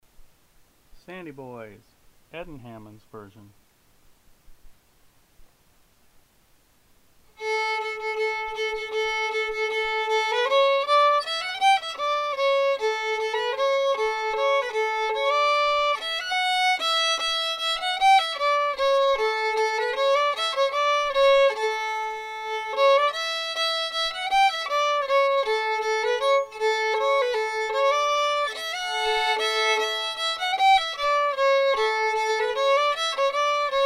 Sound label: fiddle; festival tunes